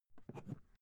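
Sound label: briefcase open soft, Büroarbeit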